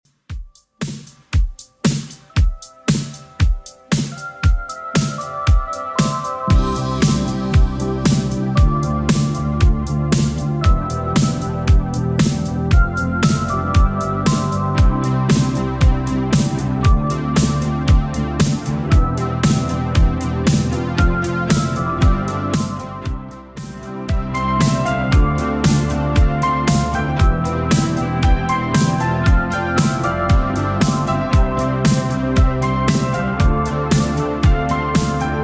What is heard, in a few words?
80s Redrum 116bpm